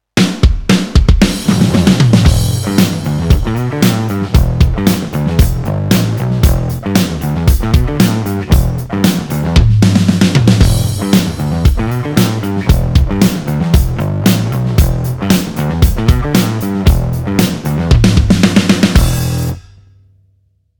Bass A RANGE

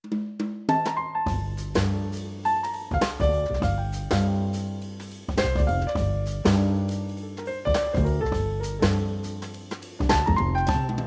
groove swing

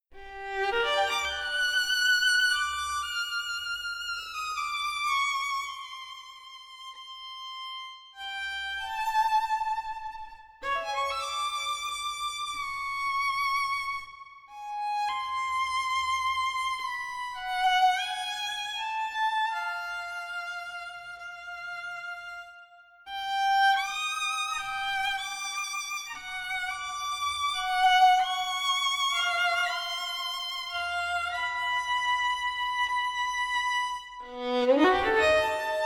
Legato Violin Live

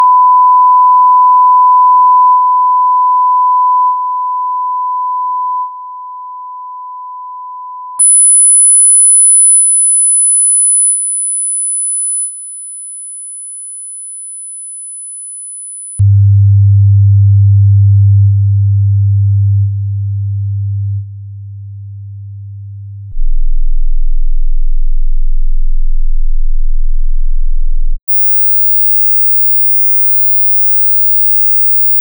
sine wave

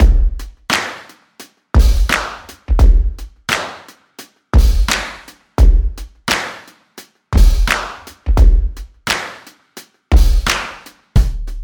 modern soul rnb

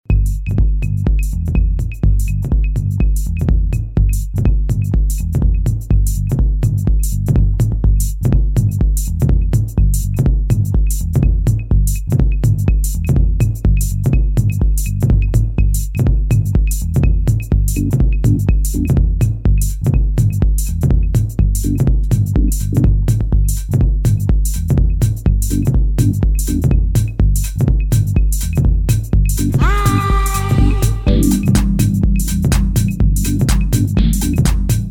lounge house

